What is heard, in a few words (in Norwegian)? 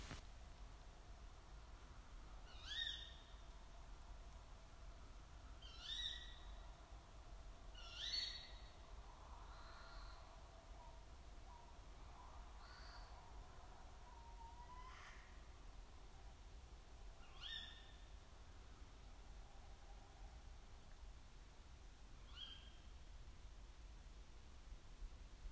ugle